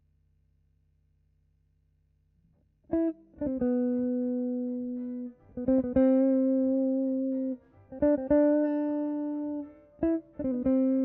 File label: GTR B